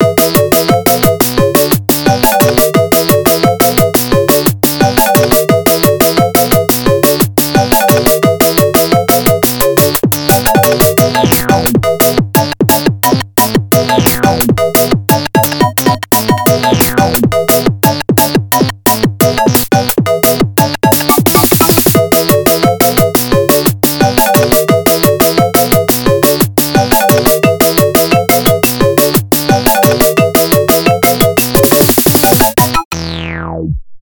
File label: RINGTONE